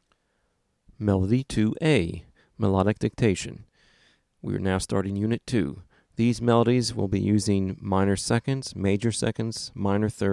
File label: music ear training melody